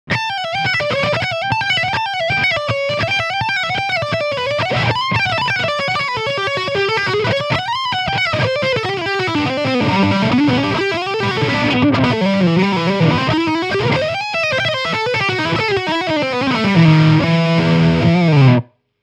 Channel 4 Lead

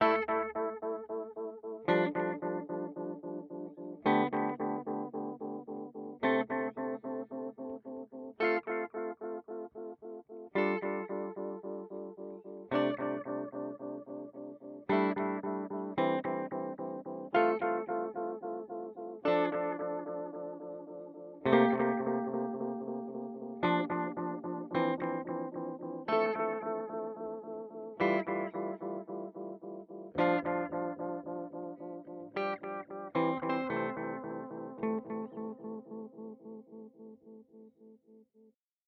Pigtronix Constellator

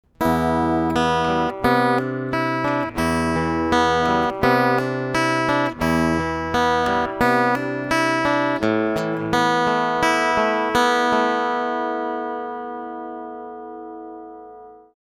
Picking EQ Flat

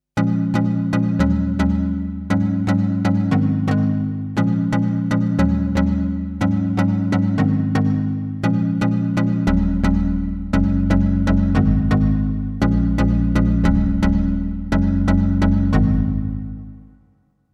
Prophet-10 rev4 Mallet Quantec QRS

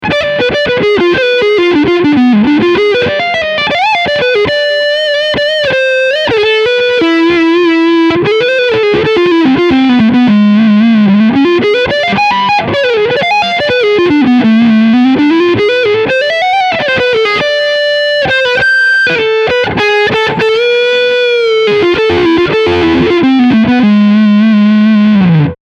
Front PU Overdrive

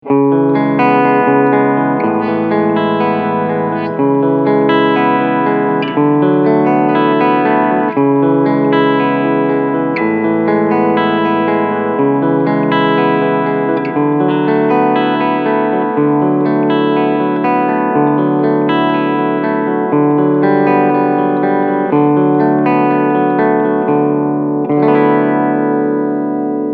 Clean

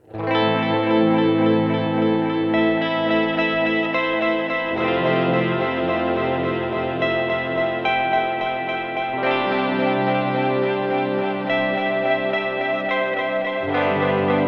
Vintage Tape; Source Audio Nemesis; Impress

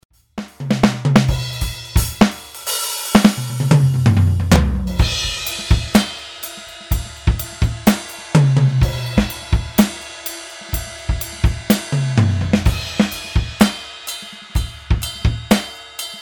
Kit RealGroove; ATV a5D

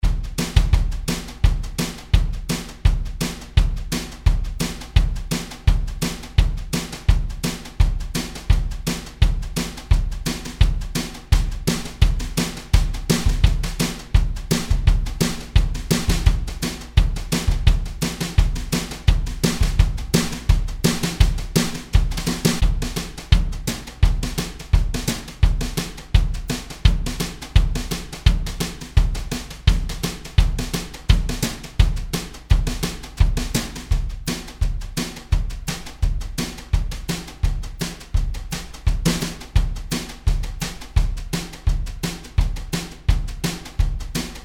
Nashville Brushes

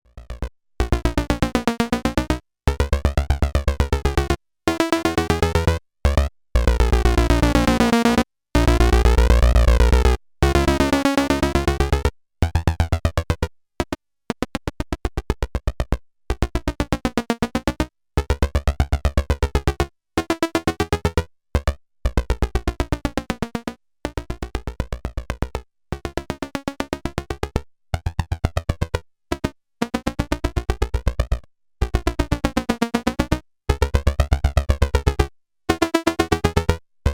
Klavis Module Quadigy Gated Cycle X4

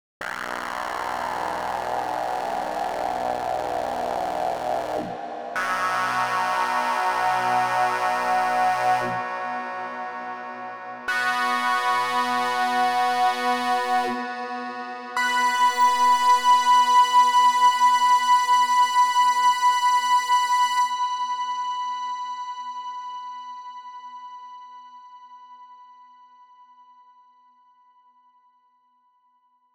SuperPlate MS 20 Clean Mix50 Decay 16s; Audicon

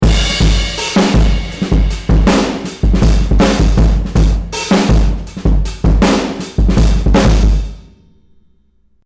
Drive

